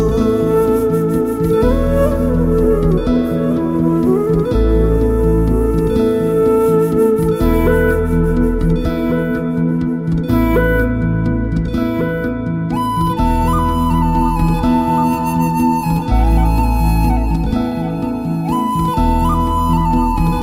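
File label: background music, Telugu Ringtone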